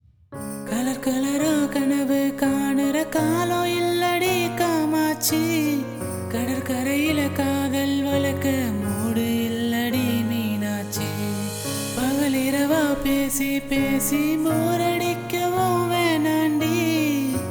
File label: Unplugged Cover